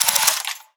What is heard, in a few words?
pistol foley clip insert; weapons; Half Life Alyx